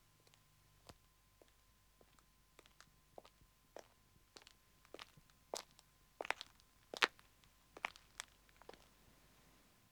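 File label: Country Road Walking man approach; Footsteps; BBC Sound Effects Library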